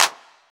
clap c key, Royality free clap sound tuned to the C note. Loudest frequency: 3811Hz; percussion; single hits one shots